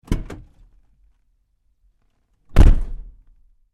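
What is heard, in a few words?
Truck GMC Savana Door Interior Open-Close Hard; 2010 GMC Savana; Trucks; Big Room Sound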